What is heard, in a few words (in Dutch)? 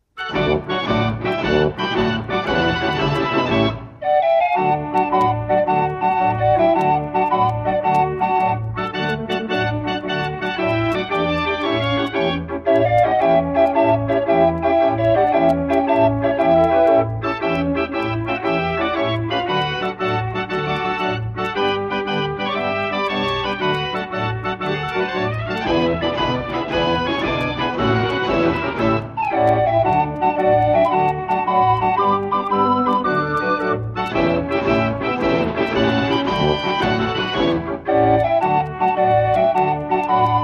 draaiorgel; de rosita